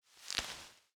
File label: gather fruit